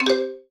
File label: PUZZLE Success Xylophone Three Note Dry stereo; linux macos switch windows; gamerip